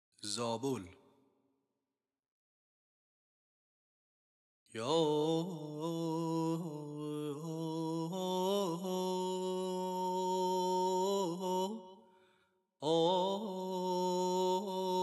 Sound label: Zabol Segah Radif-e Karimi; Dastgâh Segah